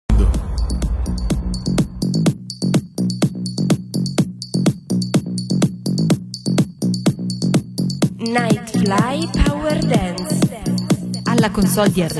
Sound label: Nightfly Power Dance